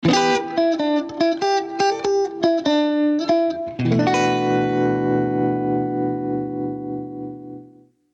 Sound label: jingle